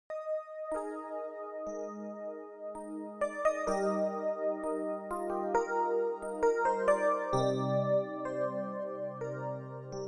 S950 EPiano

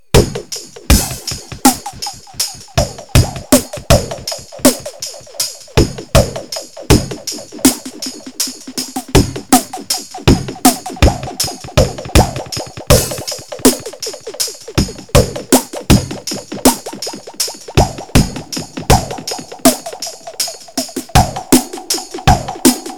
Casio Dub Beat Shifter in Delay Hall; FieldKitFX

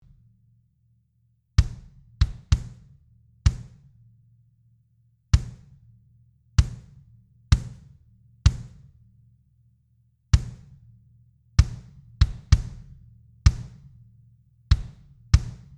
Drum Kick ART SCL2 4 1 Release slow to fast

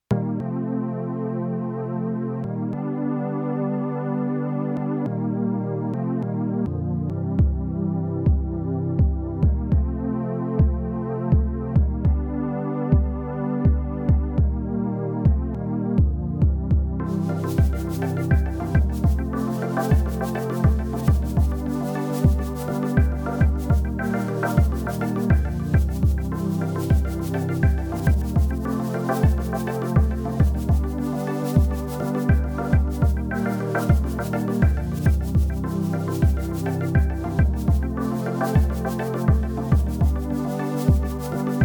Ambient

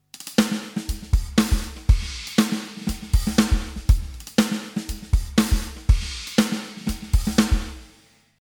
studio drums snare drum

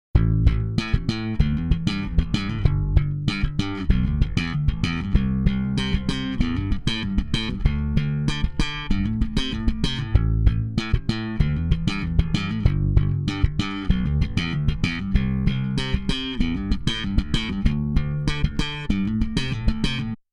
Bass Chandler EMI RS660 Limit Fast

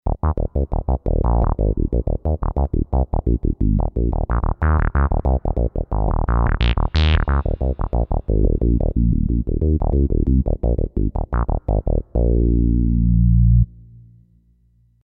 Bass

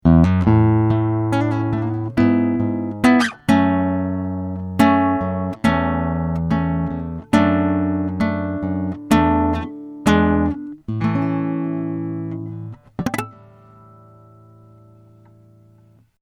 Takamine 135SC; Tears

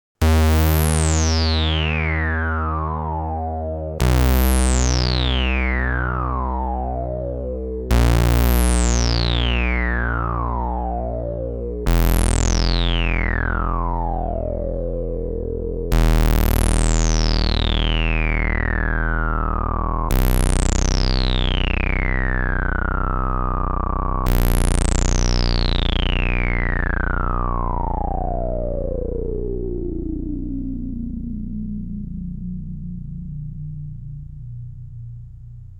teisco60f sweep

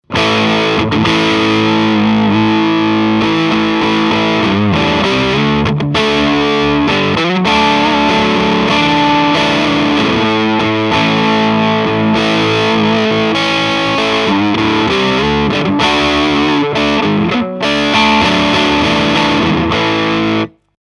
AOR Crunch

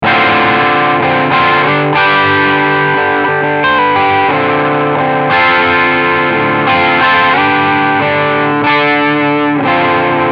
Med Gain

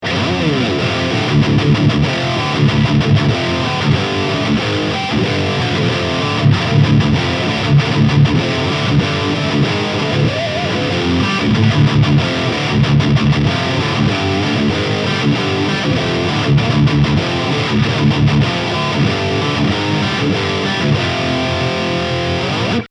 Cort KX300 High Gain

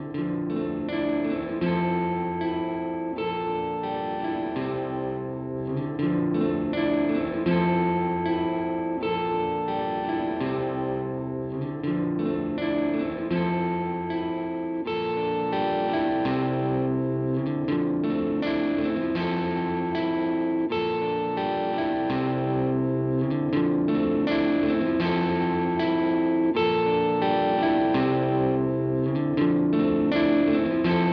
Walrus Audio ACS1 Stereo Fullerton London

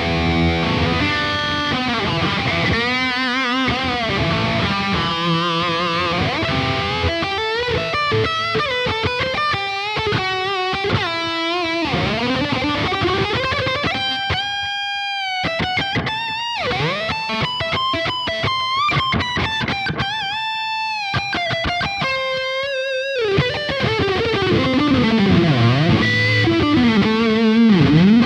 X700 Highgain Solo; Cort

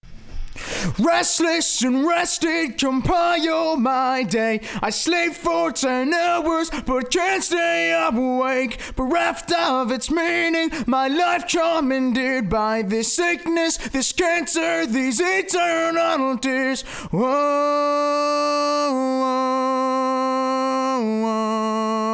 Vox

